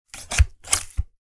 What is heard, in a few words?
Big rusty scissors cut snap